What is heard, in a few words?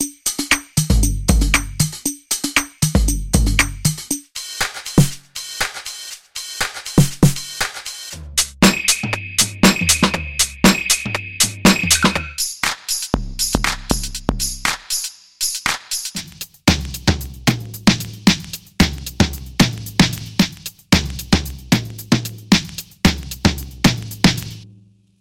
Drum Tops; NYS